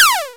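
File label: ALIEN FAL, TECHNO TRANCE; Pro-Rec - Synthsonic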